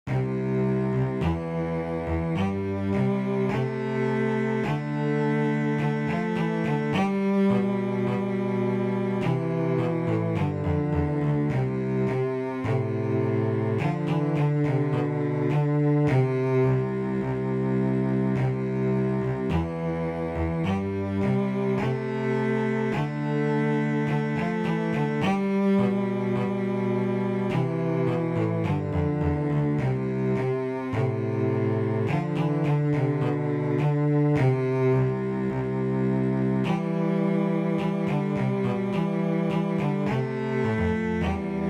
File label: Spring Hummingbirds CELLO MEL CELLO HARM, cello